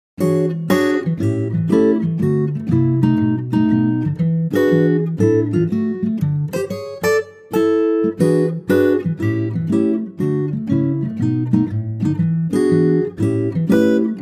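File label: Acoustic Guitar D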